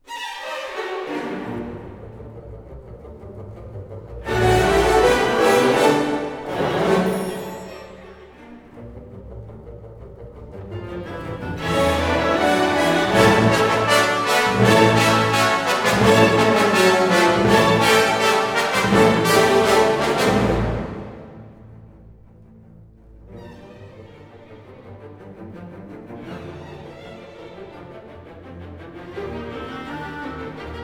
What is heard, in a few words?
Enigma Variations, for orchestra, Allegro di molto; Elgar